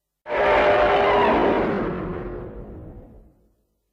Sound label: alt roar; battra